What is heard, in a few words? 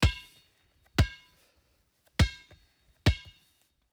EXT Basketball Dribbling; EFX